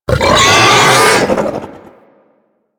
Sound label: Infested Juggernaut Vocal Attack; Warframe